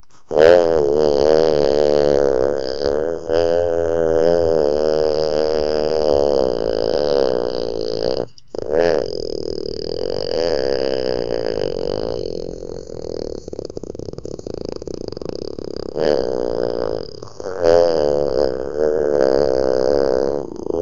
fart; hitch trailblazer

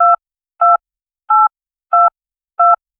phone pick up sound

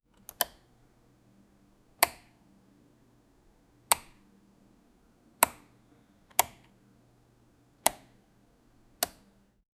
kid mobile app button tap

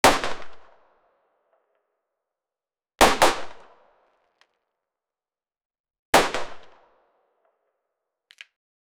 mp5 9mm single shot; gunshot

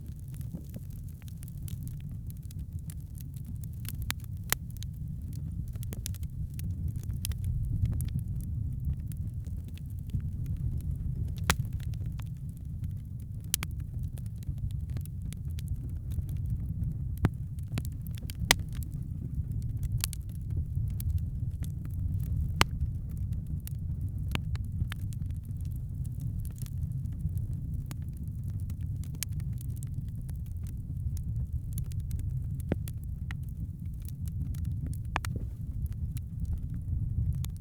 winter campfire crackling

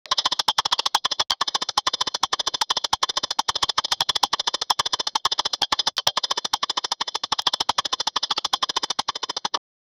racing game ui clicks

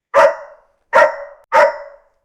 dog bark

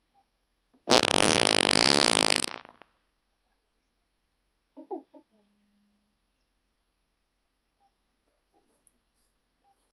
big wet fart

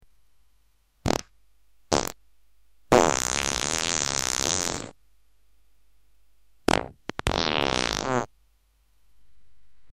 short bassy farts

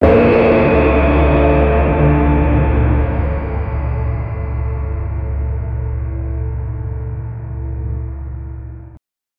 deep fantasy gong hit

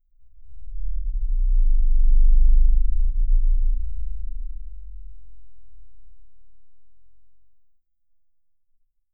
whoosh

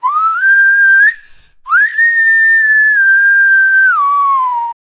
whistle

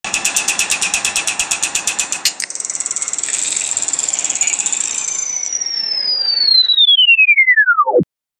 bzz bzz light goes off

